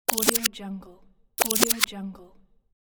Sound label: time rewind backspin